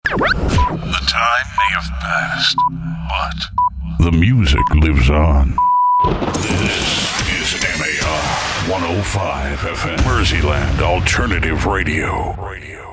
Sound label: pips; MAR Jingles